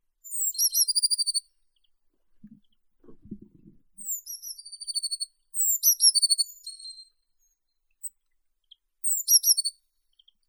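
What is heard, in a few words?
blue tit, Cyanistes caeruleus; lazorevka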